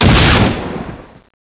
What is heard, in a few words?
Explosion Sml; XWing